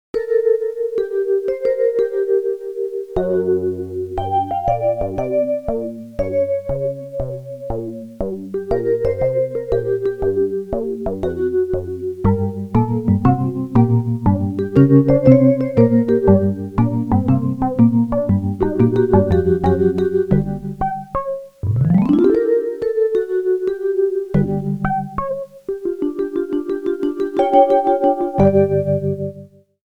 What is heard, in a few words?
incidental